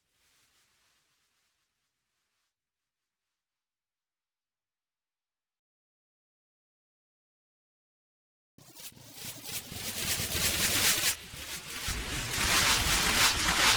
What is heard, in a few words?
In Flight Sparse to Thick